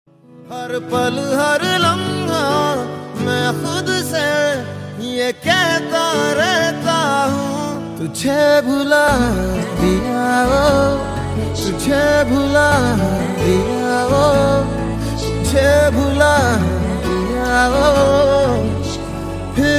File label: Sad Ringtone